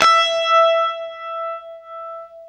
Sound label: UNIVIB E, Guitar; East West; Steve Stevens Guitar Samples Collection